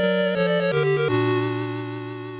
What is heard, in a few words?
Defeat; super columns; game gear